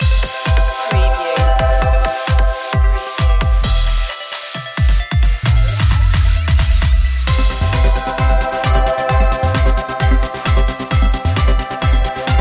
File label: christmas edm